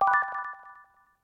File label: whistles; zuqiu